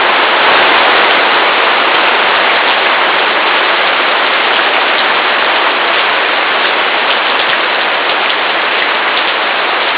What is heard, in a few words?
Nature rain heavy; Serenesound